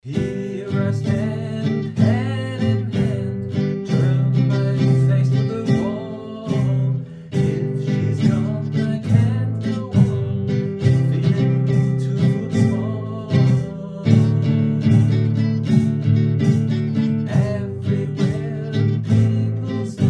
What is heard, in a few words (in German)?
Zylia Praxistest Gitarre